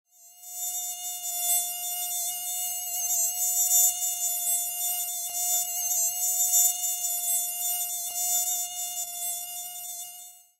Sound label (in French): moustique